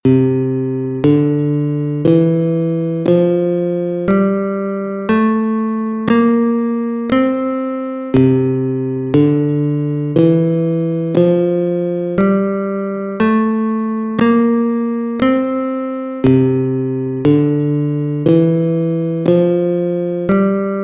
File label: C Mixolydisch Scale Guitar